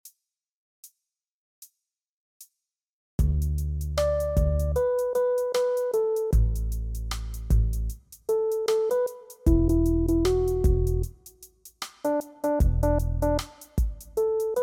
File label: Bass vocal drums